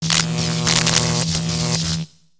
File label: Robot Electro Arcs; Lego; ambience nexus tower